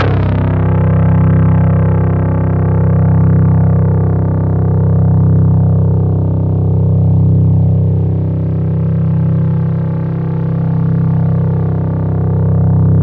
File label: C1, Synth Line; 90 s Sample CDs; NiceBeats; SynthLine VOL-33